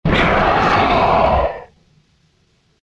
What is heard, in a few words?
jumpscare sound; pnuozhika 2